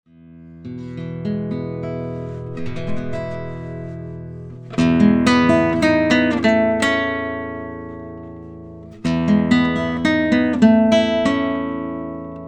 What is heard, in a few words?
Neumann KM184 Nylon Guitar, cardioid condenser